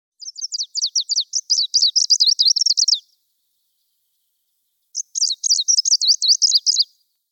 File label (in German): Gruenlaubsaenger Phylloscopus trochiloides; Vogelstimmen